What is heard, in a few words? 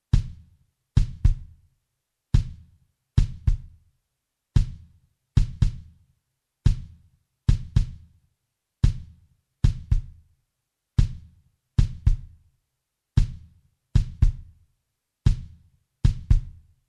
Kick; Golden Age Project, COMP-54